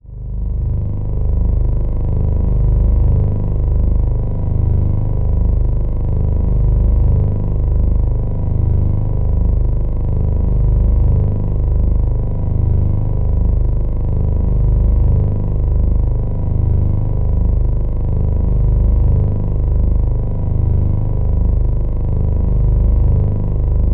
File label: ambience tonal synth; bluezone; sonniss gdc